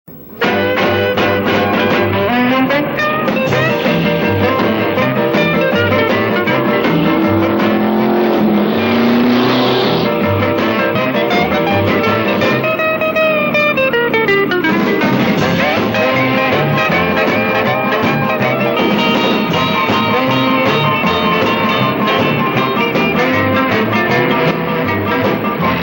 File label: Untitled instrumental